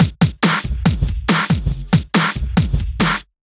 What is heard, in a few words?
Break Beats, 140 BPM